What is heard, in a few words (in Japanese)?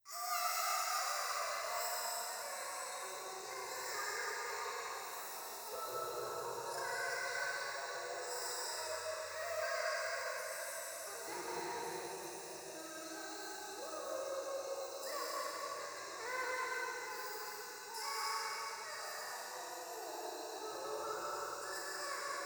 ゴースト シャブ喘ぎ 山奥夜